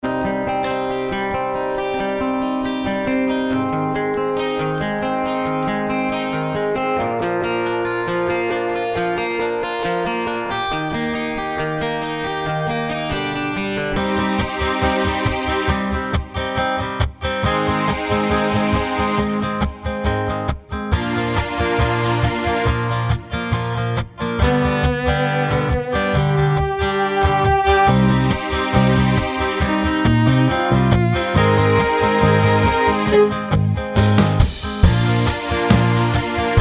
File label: Rock Ballad, trip-hop